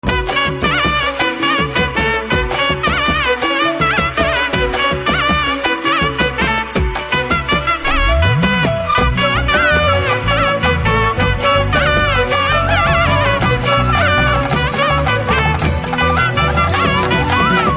BG Drum Beats Ringtone; Telugu; from Pushpaka Vimanam Movie

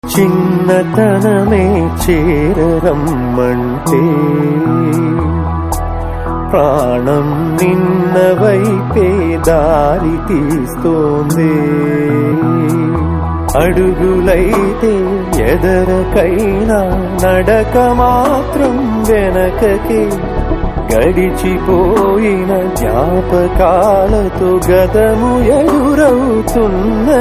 Ringtone; Prati Roju Pandage Movie; Telugu Ringtones

